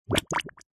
cartoon slime goo bubbles swamp single; zapsplat

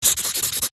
pen sharpie sign signature; office

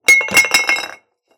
Wine Bottle Empty Knock Over Concrete

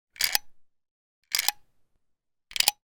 gun 1911; weapon; still north media

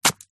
horror kitchen knife stab body guts squelches x1; zapsplat

